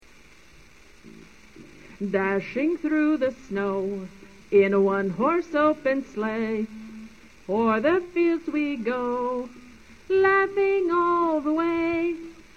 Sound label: Jingle Bells